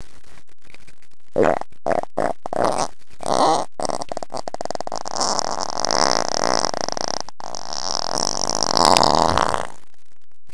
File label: fart